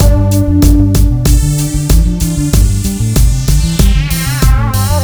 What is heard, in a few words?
PULSE